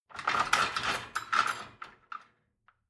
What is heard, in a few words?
dishwasher cutlery basket take out